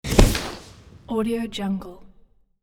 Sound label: explosive potion poof; hollywoodedge